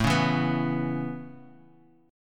A Major Add 9th A, chord; Aadd9; strummed; JGuitar

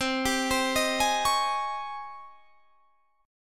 G sharp Major 11th C

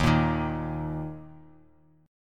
D sharp 5th; strummed; D#5; 11,13,13,x; JGuitar

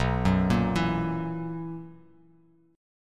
B Major 7th Suspended 4th B down; BM7sus4; arpeggiated

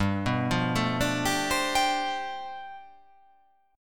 G 11th

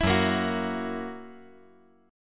F sharp 7th

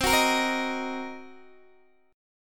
C Minor 7th Flat 5th C down Guitar DADGAD

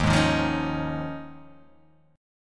D sharp 9th Suspended 4th, Guitar; strummed; x,x,1,1,3,0,3,0; JGuitar

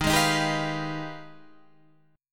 Ab Minor Major 13th

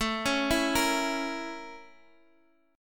Bb Minor Major 7th Flat 5th

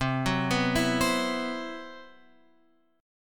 C Major 7th Flat 5th C; CM7b5 Chord {8 9 9 9 X 8} arpeggiated

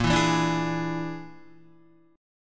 Bb 7th Flat 5th, Guitar; 5,7,4,7,4,4; 4F,4A,5C,5G,5C,6E; Bb7b5 strummed; JGuitar

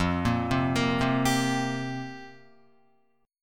F Suspended 2nd Suspended 4th F down Guitar; arpeggiated; JGuitar

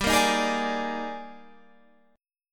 Eb Major 11th G, guitar

